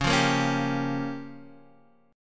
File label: Db 6th Flat 5th Db down Guitar DADGAD